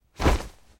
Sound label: Heavy Cloth Snaps